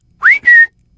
whistle; barney; e3 street; hl2

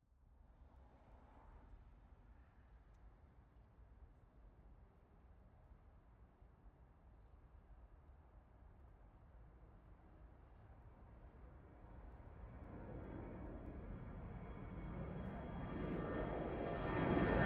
Planes, Takeoff; BOOM; aXLsound; Planes - Takeoffs and Landings

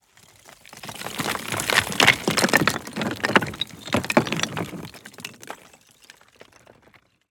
building collapse debris falling rock; BluezoneCorp

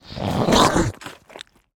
mountain dweller creature orc grunt; Bluezone; Mountain Dweller - Orc Sound Effects

